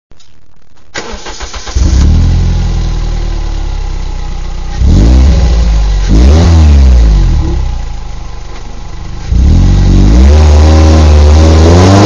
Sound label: ppe header downpipe with tte, exhaust